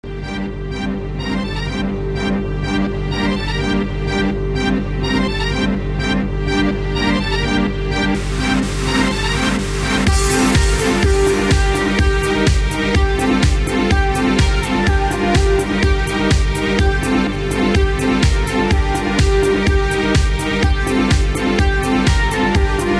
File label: trance classic